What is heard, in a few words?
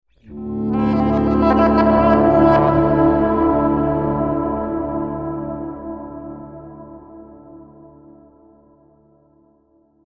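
Musical Element, Drum Snare March; Blastwave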